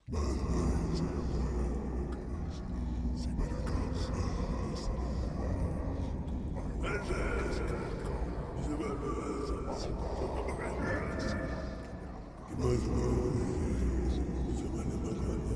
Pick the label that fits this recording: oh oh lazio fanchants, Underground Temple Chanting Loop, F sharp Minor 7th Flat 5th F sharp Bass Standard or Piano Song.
Underground Temple Chanting Loop